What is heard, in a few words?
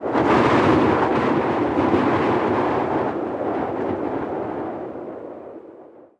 wind gust; ambient